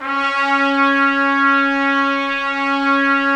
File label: Trumpets; Roland - LA Composer vol. 4